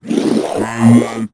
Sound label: Robot; little robot sound factory